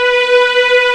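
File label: B4 legato F R, string; East West; The Ultimate String Collection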